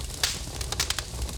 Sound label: fire; plague